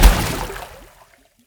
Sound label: water death